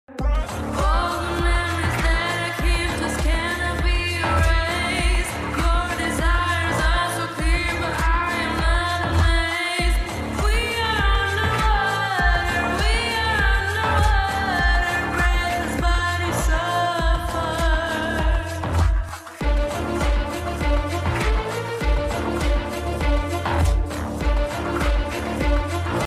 bgm ringtone

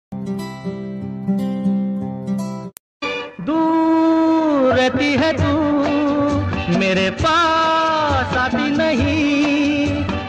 old hindi song ringtone romantic ringtone; old rommantic tone

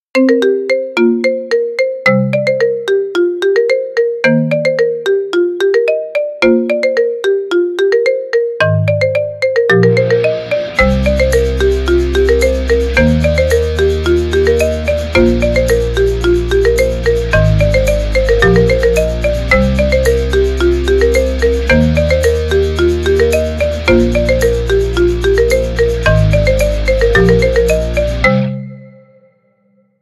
theme marimba ringtone remix; Fairy Tail

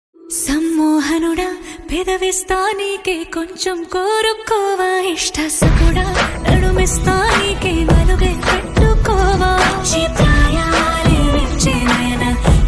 ringtone, telugu; rules ranjann

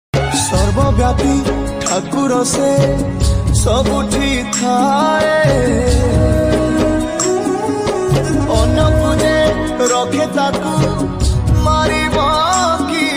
odia bhajan instrumental ringtone

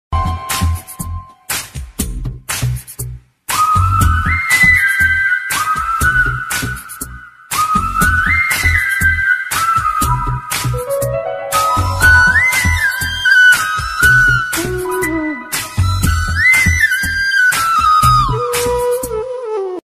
kannada movie love ringtone; abhi

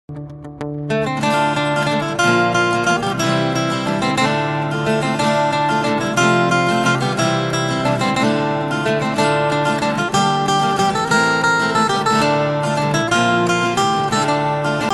guitar cover instrumental ringtone; pirates of the caribbean theme; jack sparrow

